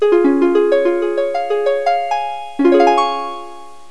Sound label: HARPA, harp; Pipo-Aprende musica con Pipo